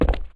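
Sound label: gritty cement land, footsteps; chief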